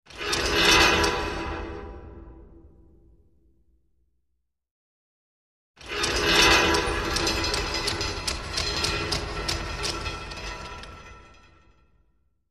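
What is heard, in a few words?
Chain; Eerie Metal Ratchet Clanking, With Reverb.; chains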